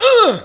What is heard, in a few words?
pc hit; specops